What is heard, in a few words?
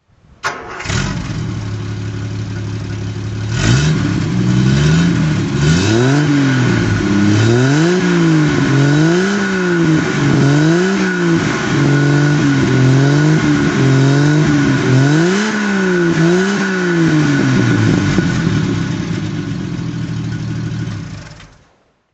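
triumph tr 250 throttle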